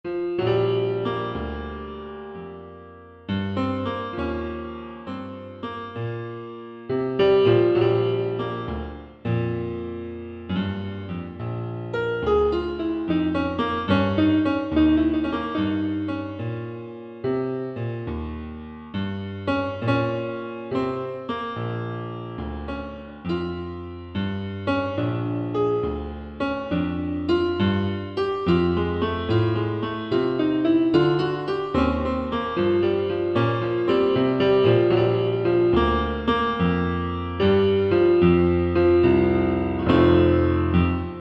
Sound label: Piano Blues